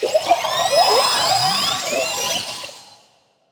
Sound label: BABY MOLOCH alarm